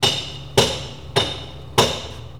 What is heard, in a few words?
Hammering, INDUSTRIAL